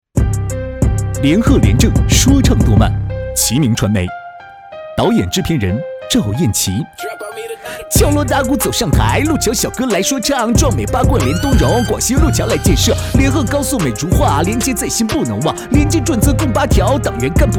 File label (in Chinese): 说唱廉政